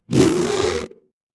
cartoon stretch

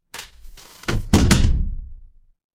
transition impact hit

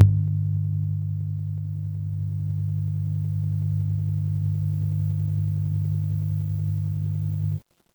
fire electric hum

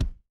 impact thud

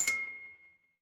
feedback success

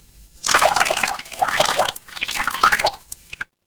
water swirl

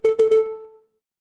ui alert

